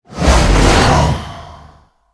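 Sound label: Fire Titan attack; Gamigo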